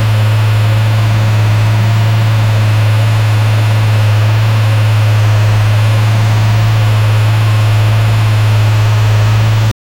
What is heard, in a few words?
constant low engine hum, truck